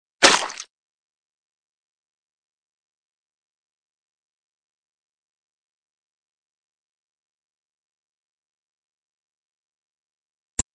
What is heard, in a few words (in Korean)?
Fights, 싸움-전투; 헐리웃특수효과음